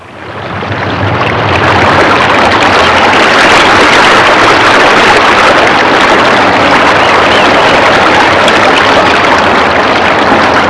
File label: more rushing water; Nature